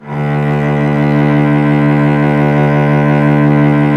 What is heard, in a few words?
STR VC. D, SOLO SECTION; Roland - LA Composer vol. 1